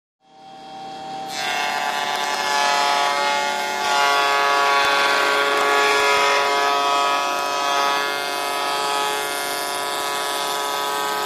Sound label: Shaper Wood Shapes Wo, Shaper ( Wood ), Shapes Wood with High Pitched Whin; Shaper ( Wood ), Shapes Wood with High Pitched Whining, Turn Off with Long Wind Down.